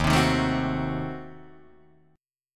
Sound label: Eb Diminished 7th Eb, Guitar Drop D